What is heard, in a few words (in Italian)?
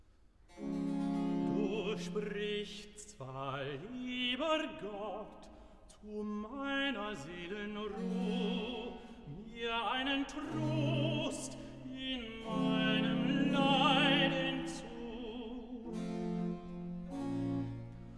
Recitativo; Sun After New Year; BWV 153 'Schau, lieber Gott, wie meine Feind'